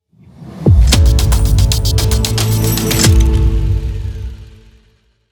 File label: soundlogo; wuerth